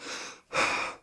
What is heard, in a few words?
sprint breathe, police